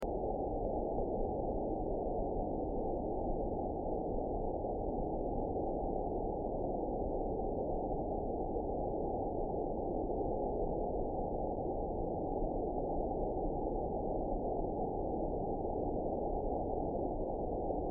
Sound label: Ambient Wind; horror; horror sfx vol 1